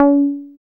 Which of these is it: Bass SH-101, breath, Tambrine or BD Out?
Bass SH-101